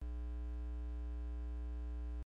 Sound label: Static Aux Original Noise